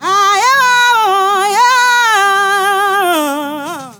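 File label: ZULU CALL, SOLO FEMALE; Spectrasonics; Heart of Africa 2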